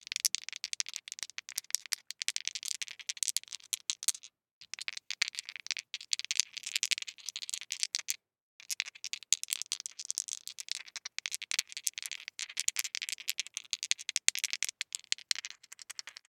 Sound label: DICE in One Hand, Shake, Two Dice; Samples; ArticulatedSounds; DICE Sfx Party (Extended)